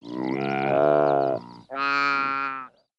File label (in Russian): zvuki dvuh antilop